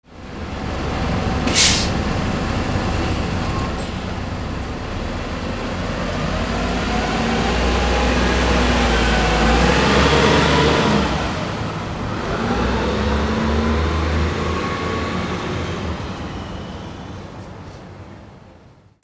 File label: VEHICLES